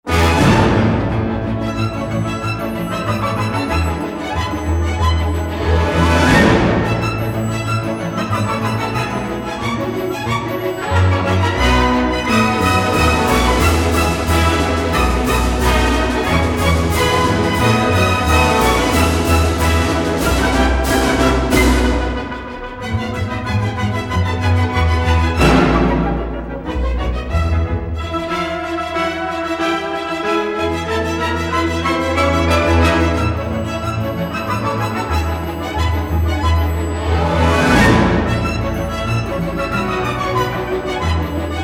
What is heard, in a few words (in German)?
Polka; Tritsch-Tratsch